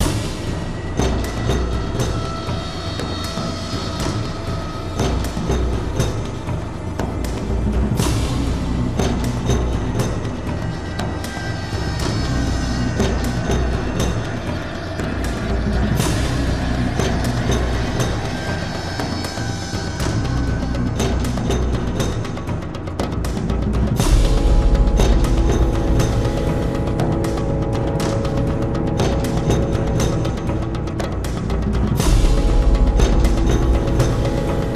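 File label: TRYP STATIO ALL PERCUSSION CLUSTER, soundtrack; transformers war for cybertron gamerip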